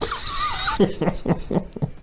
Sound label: Laugh